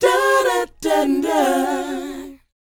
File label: DOWOP G#4; ILIO; Vocal Planet